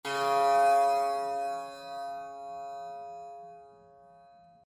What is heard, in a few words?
tampura; Latcho drom